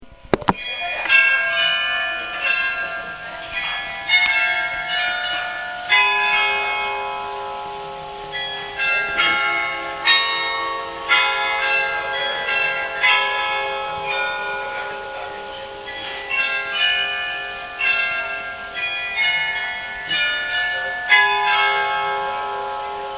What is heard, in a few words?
praha bells nice